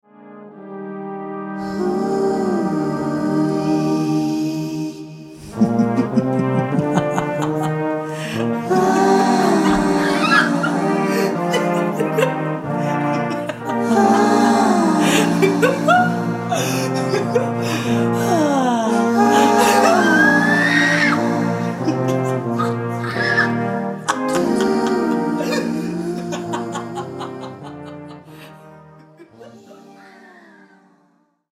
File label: Freuden Jodler – Pleasure Yodeler